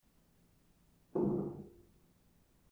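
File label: supun, spoon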